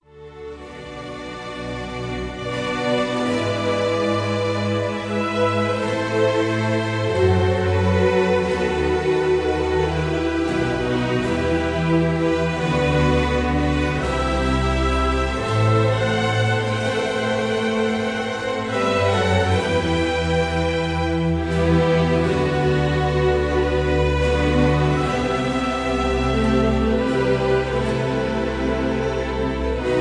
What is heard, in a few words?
overture, Handel Messiah Overture; lpo boult